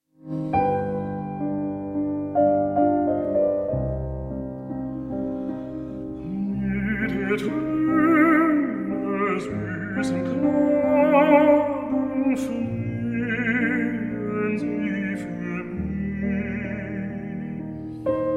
Schwanengesang song cycle for voice and piano D957 no 4 Standchen